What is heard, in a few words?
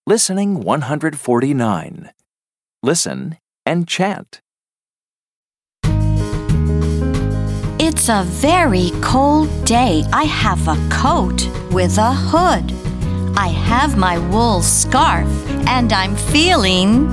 Chant